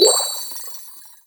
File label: Multiplayer SFX - Clam Blitz - Power Clam Spawn